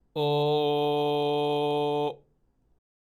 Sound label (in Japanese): 普段の喉頭のGの声で「お」